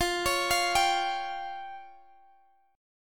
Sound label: C sharp Major Flat 5th, chord